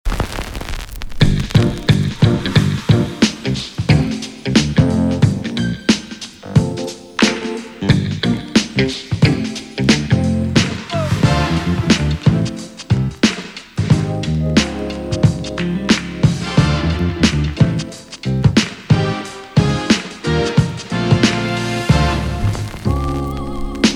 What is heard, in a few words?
HIPHOP